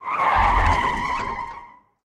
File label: skid GPS; Blind Drive